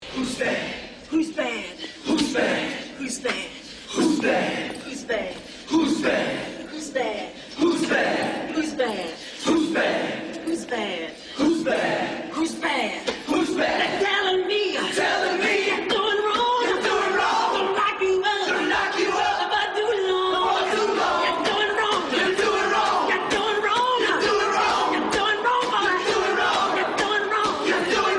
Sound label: bad solo